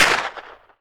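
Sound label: magnum exterior shot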